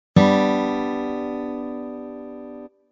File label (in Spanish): triada sol mayor